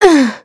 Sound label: pain; fade